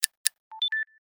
Schedule, notifications; LG K92 5G

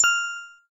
doremi star; SKY IM-A600S-Sirius; keytone

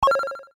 Videogame open; Nokia; Audio themes

